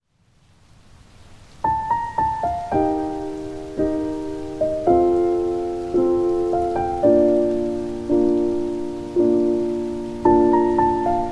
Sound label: Healing Song (With Rain)